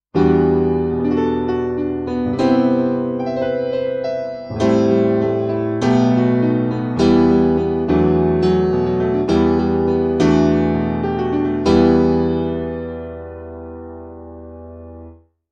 Grand Piano 2 mit ParaEQ

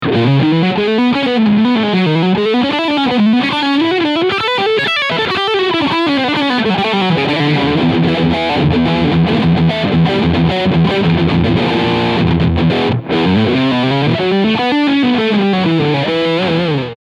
Steg Riffsound

